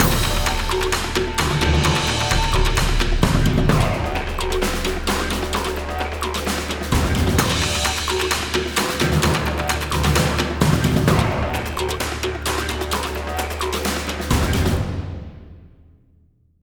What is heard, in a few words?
Combat; Skellige - Ice Giand Island